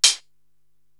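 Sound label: HH Close, hi hat; Korg TR-Rack; Drum Machines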